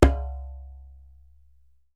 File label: DJEMBE, African Perc